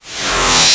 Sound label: Sweeps Short